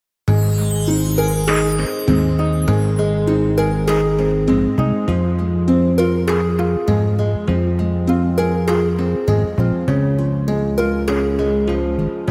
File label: Instrumental Piano